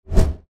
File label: GUI Swipe